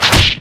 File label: Blow; Life of a Naomi